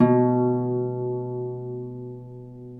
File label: B1 CELTIC HARP; Northstar - New Gold VOL-2